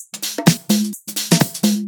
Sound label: Percussive Loops 128BPM; Vengeance Samples